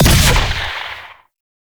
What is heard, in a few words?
Ice Sniper Fire; Warframe; Railjack; RailJack Weapons